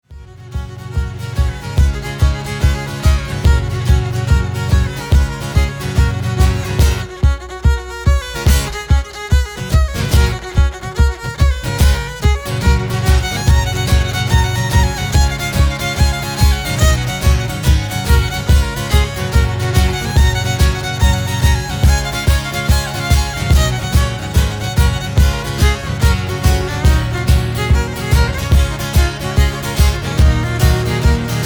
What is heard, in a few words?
Celtic Fiddle